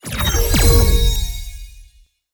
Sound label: ui kill boss all